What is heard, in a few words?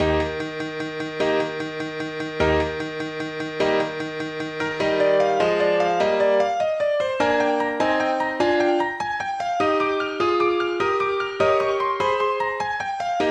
Piano Sonata